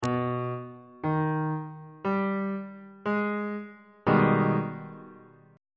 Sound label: a flat minor major 7th 1st inversion on bass clef